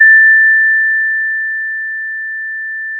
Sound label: pop, Sound of a phone ringing